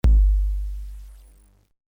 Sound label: kick 808